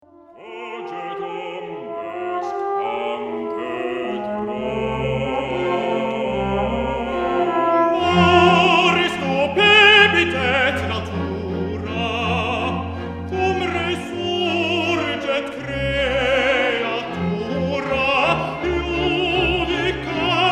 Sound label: Tuba; Tuba mirum - Mozart requiem; Tenor